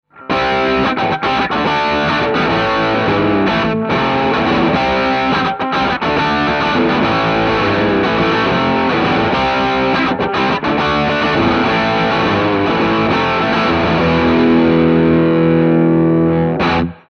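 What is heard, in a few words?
Shure SM7B Electric Guitar Chords HPF; ssl six ch channel strip 500 series preamp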